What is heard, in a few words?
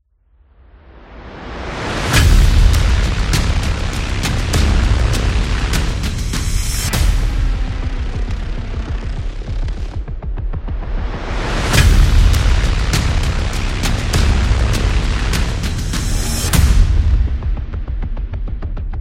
Hybrid Action